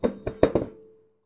Glass Bowl Wobble; SFX Kit; Foley - Misc

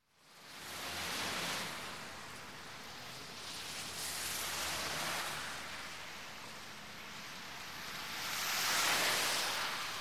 Light Highway Traffic 50-60 MPH, Rain and Thund; Sound Ideas 2000 Series

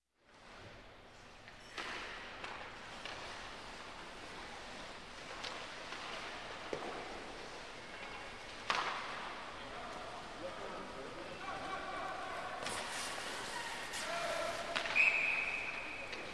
Hockey Ambience; Sound Ideas 2000 Series

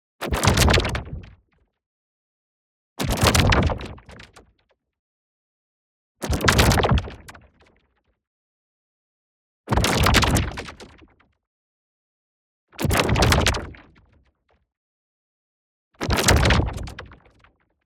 ABILITY DEACTIVATE Particle Umbrella; boom library; Matter

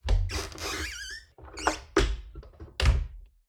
Wardrobe

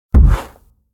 Footstep From Monster Dinosaur

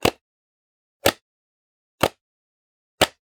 Generic Button Wood Medium

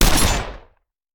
Shotgun; Sound Ideas; Guns

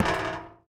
Street Ball Metal Rim, Street Basketball; SFX Kit

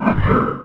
Menu Horror

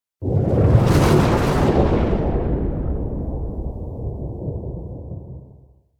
Thunder Clap - Light, More Rumble; Hollywood Edge; sound designer's toolkit v2

